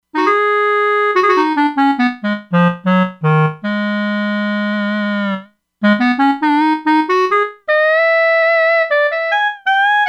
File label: Clarinet-Bb; Factory Presets; E-mu Proteus-2